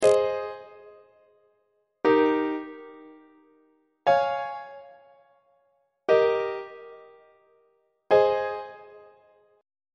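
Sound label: a flat major inverted imperfect authentic cadence; on treble clef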